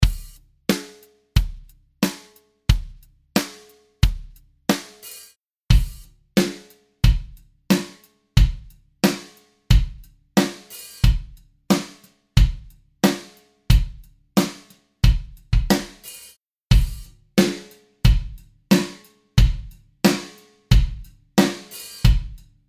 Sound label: DRY DRUMS ALTIVERB